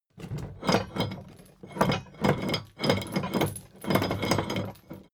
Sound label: drawbridge winch hoist sluggish; soundpool